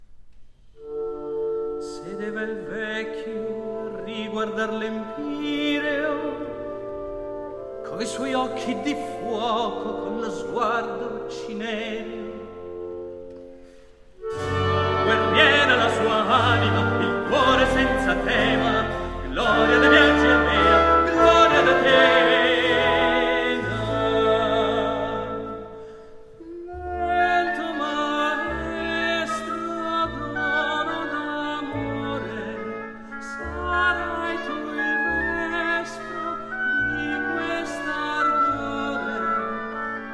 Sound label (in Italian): Recitativo ed Aria